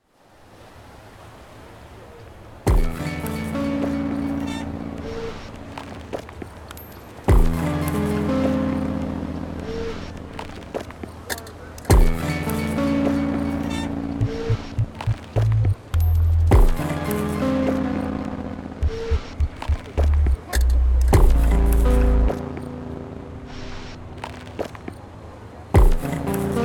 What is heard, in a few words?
Main Menu Ambience; Going Under